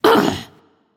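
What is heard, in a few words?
grunt hit, female; smite; voicepack; hera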